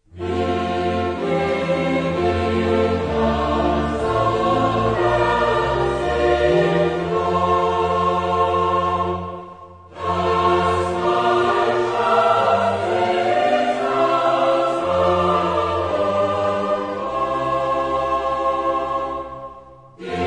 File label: Cantata, chorus; Bach; BWV1; Wie bin ich doch so herzlich froh